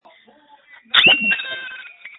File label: Scream